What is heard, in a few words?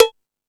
Dance 2000; E-MU Pro-Cussion